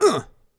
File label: Player Land; liblast